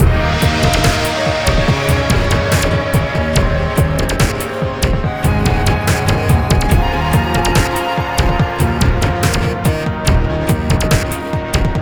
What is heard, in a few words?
Battle Intro; Asterix Obelix XXL 2